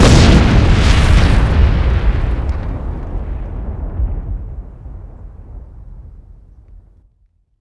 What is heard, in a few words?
dynamite bundle explode; tf2